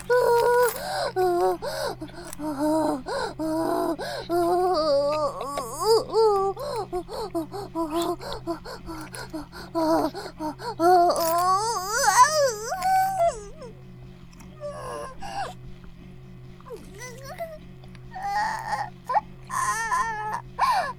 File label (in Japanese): バイブ放置, ASMR